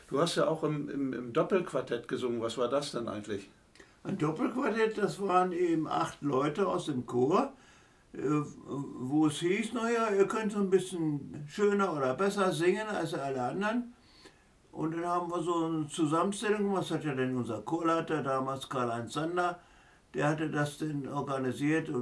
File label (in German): Doppelquartett